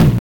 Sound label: Tom, Genesis; Sonic 3; Launch Base; M8